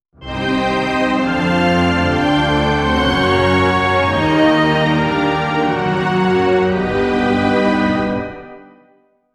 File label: epic but soft orchestral, noble